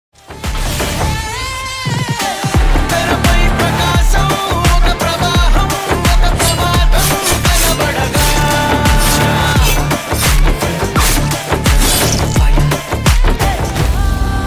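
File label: Telugu background music ringtone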